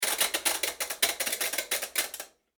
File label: Household Washing Machine Dial Turn Long; Cleaning